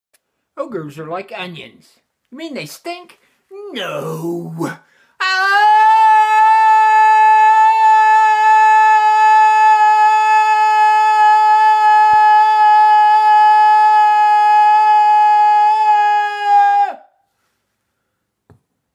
Roar

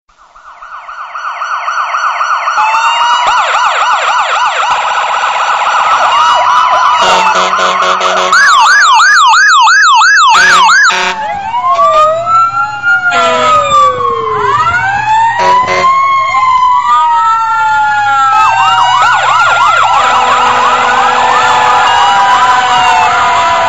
police siren

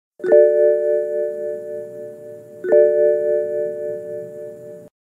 BMW Chime

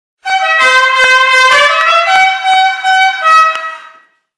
dixieland horn

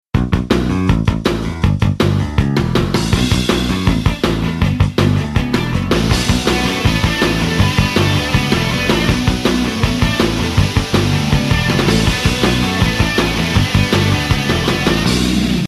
cool music; nfs

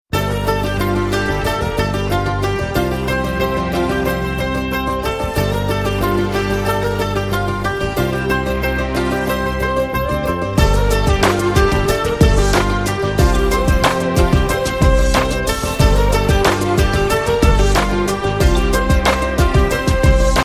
guitar

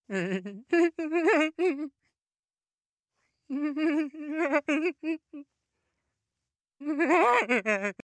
laugh; peter griffin

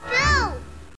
boo

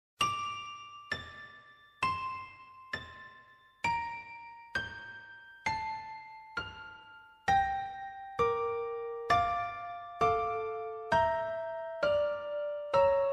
Sad Theme

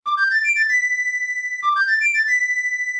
Flute Text; Zelda

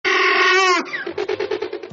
Velociraptor Howl

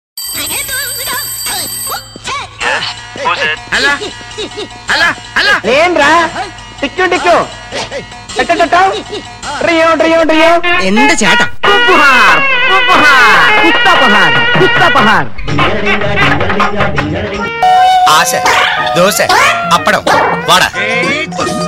ultimate telugu mix

